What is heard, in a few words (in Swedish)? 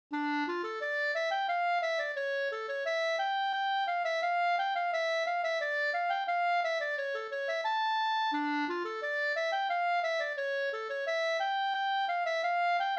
polska fr Lappfjard; Lappfjard fi